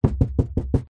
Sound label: foley polystyrene sheet knocks; zapsplat